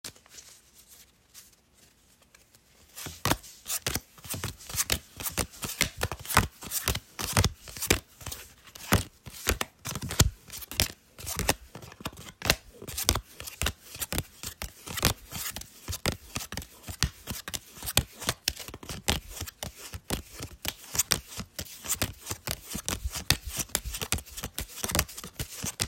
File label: Cards